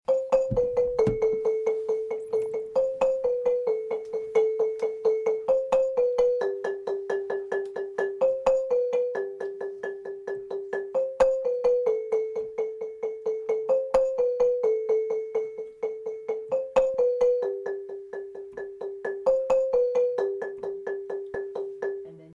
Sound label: low, marimba